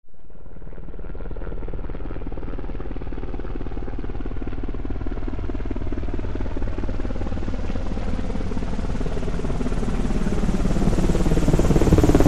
flight

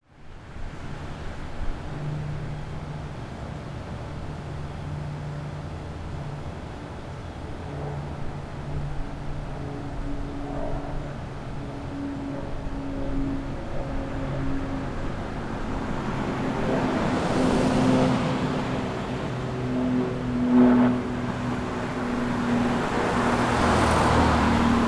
AMBIENCE

